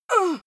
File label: female hit; soundpool